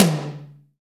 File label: TOM TOM, Real